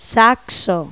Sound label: saxo; Pipo-Aprende musica con Pipo